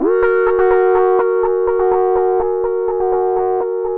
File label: Analog Rhythm